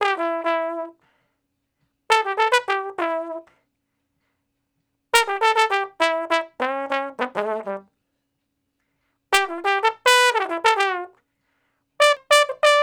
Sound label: Trombone Straight (E), Horncraft for R&B Solos; Crimson Blue and Fabulous Horncraft 4 RnB